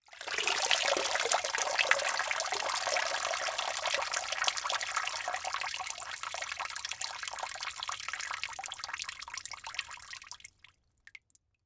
Liquid Water Water Cup With Holes Drips

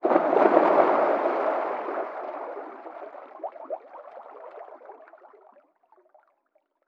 Underwater Water Splash Dive SCUBA

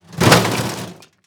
Impact Metal With Debris Hit Cement Short

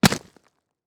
Impact Wood Chop; Impacts

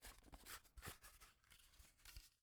Plastic Flash Light Top Screw; AdobeCC

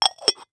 Household Jar Glass Jar Close

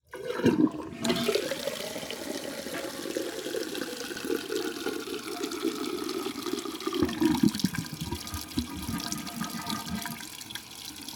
Toilet Flush And Running, Household

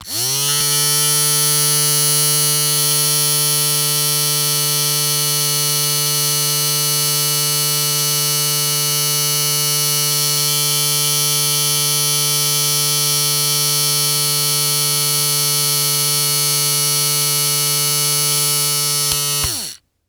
Industry Tool Motor Run Small

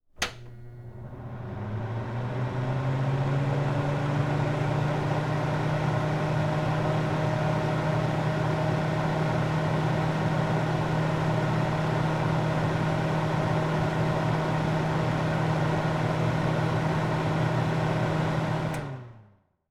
Household Oven Run Hood Fan On Low

